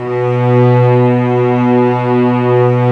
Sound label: STR VCS, f Amb